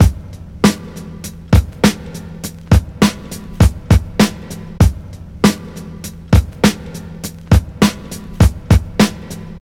100 bpm breakbeat f sharp key, kick tuned to the F# note. Loudest frequency: 955Hz